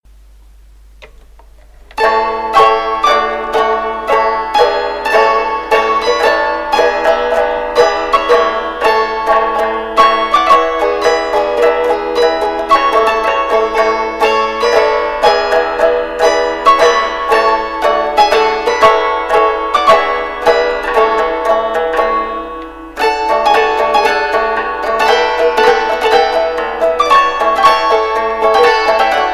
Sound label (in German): Marsch